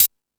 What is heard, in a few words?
Fusion Stix, E-MU Pro-Cussion; drum machine